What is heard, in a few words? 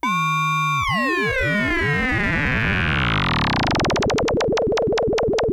Moog E2; Hollywood Edge; Giorgio Moroder Rare Synthesizer Collection; MLTI.MOOG